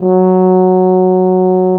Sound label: tuba loop note